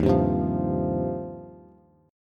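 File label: E flat 6th Add 9th, Bass; strummed; 11,10,10,10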